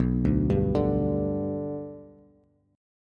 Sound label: B Minor Sharp 5th, Bass; arpeggiated; JGuitar